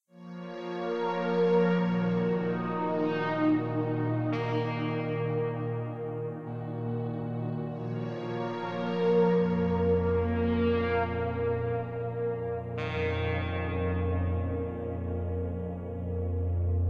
Menu Theme; Door to Door

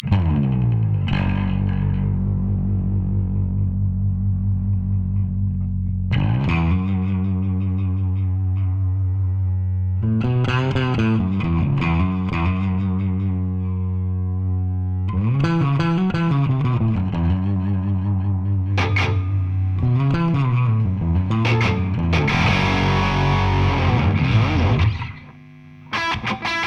Guitars & Bass; gamerip; Splatoon 3; Clickbait (Splash Sessions Demo) (Phase 1

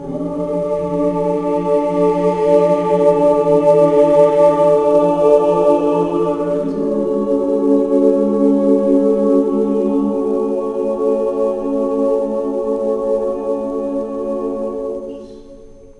Ambient; Ghostbusters The Video Game; NDS

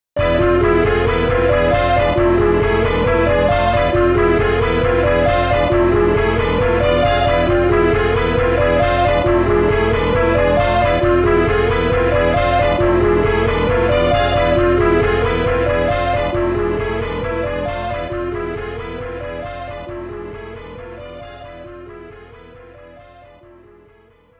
Continue; super rom cassette disc in jaleco

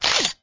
Camera shutter

